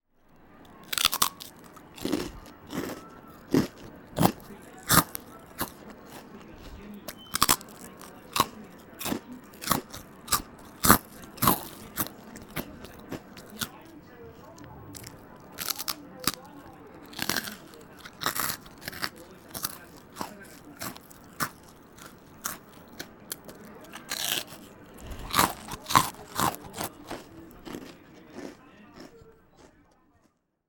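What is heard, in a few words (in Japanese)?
osenbei wo taberu, eating rice crackers; おせんべいをたべる音; Chino Cultural Complex; 茅野市民館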